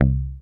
HOFNER MUTE, Bass

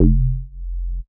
bass classic thicc F; Polyend; ARC Noise

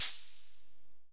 HHO, Fricke MFB 501

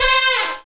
BRASS FALL, swing synths; Zero-G - Funky Elements

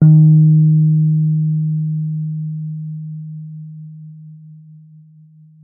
Wurlitzer D#3; Xsample VOL-1; 90 s Sample CDs; WURLITZER B

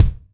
Kick, Kickdrum; Equipped Music; Loungin House

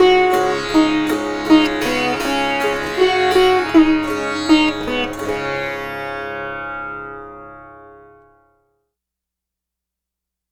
Sitar, East Indian; Sonic Foundry (Sony Creative Software); Continental Drift Aftershocks

